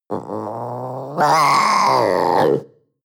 panther young cry

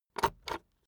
puncher punch paper

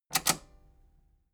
metal button robust spring click disable fast

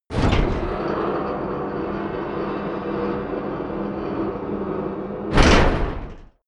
gate metal medium close

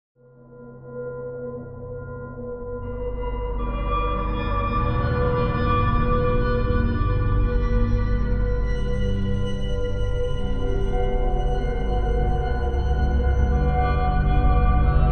unreal bells drone

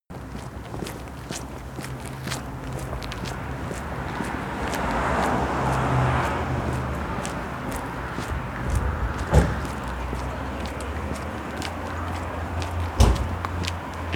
walking down the street

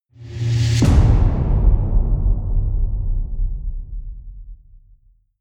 big drums long

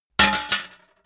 metal plate thin drop

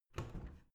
washing machine modern open

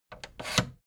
fader plastic scrape single down halfway medium

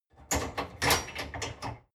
dishwasher frame fold up

